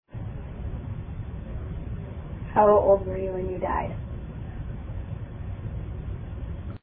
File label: evp